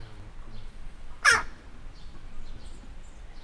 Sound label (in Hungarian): csak egyikőjük szólal meg, csóka; állathangok; miskolci zoo 2018